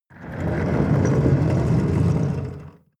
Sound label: metal roll